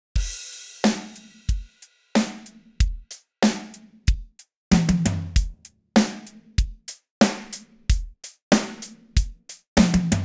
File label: Untitled drum